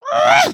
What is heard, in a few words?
air guitar; emotes